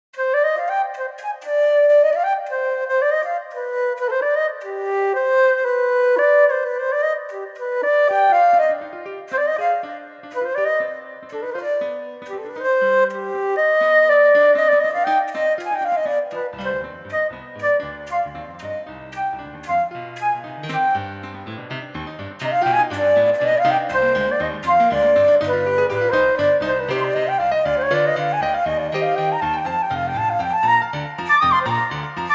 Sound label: fugue flute